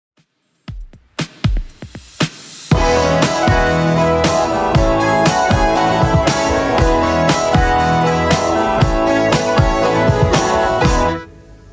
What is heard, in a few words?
70s Redrum, 118 bpm